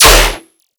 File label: bass oneshot gun D